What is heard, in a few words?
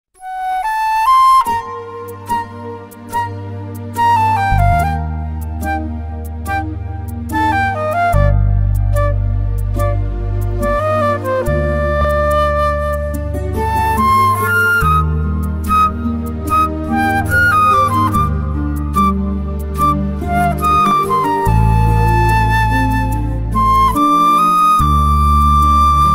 flute version tamil love bgm; raja rani; love feeling bgm